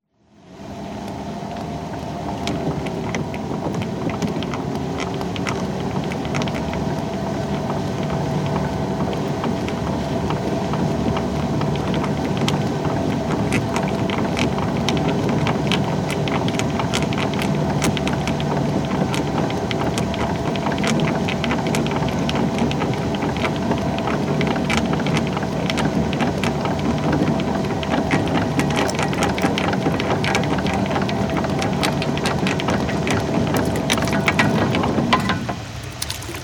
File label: Anchor Being Raised; SOUND EFFECTS1